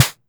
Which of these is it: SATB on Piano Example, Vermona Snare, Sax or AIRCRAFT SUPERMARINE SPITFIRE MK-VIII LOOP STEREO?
Vermona Snare